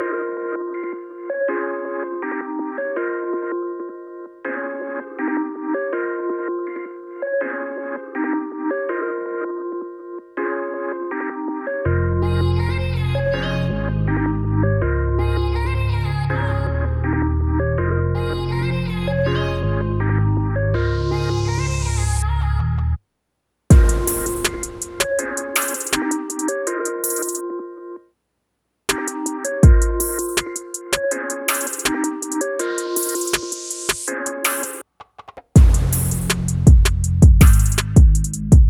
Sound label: Instrumental